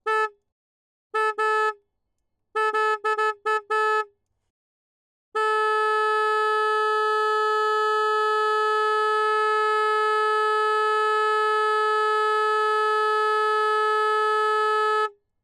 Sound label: Harley Davidson V Rod, Horn Various; Night Rod 2014; Pole Position